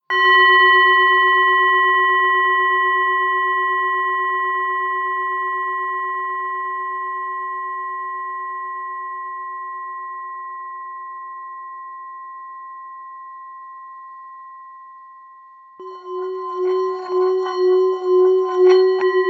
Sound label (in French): Bol chantant tibetain bronze